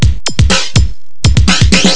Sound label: techno